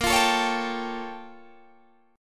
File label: A sharp Minor Major 13th, down, Guitar, Open D